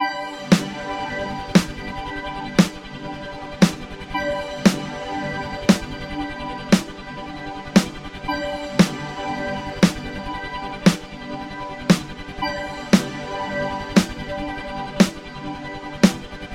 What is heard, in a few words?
Tune Your Drums; Ableton Live